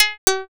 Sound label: fishing bite